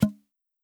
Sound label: Human Hunter Pop